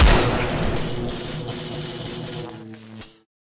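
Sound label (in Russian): неточный выстрел с коротким замыканием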